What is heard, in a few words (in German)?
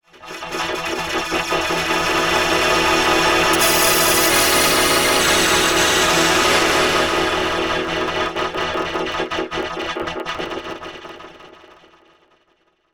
Granular synthese Variable Aux Decay; Emu EIV classic und Ultra